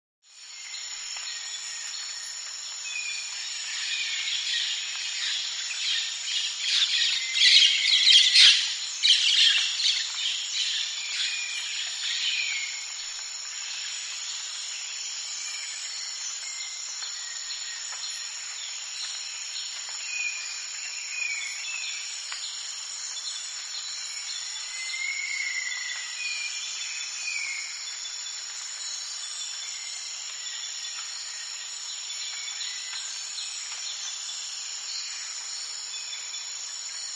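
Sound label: Rainforest Nighttime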